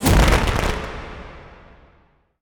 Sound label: flashpowder